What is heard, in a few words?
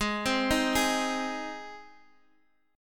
G sharp Major, Guitar Open D; x,11,10,9,11,x